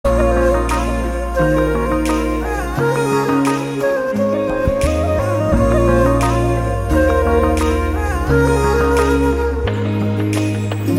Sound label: Love Bgm